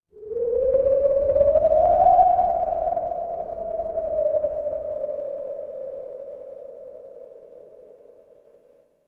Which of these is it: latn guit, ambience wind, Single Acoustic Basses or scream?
ambience wind